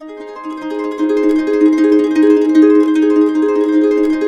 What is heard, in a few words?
BISBI, Complete Harps; USB Soundscan vol.62; AKAI